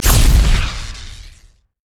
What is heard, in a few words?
steamgolem powerfist; LoL; blitzcrank